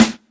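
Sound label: classic hip hop Snare; Classic Hip Hop Mega Kit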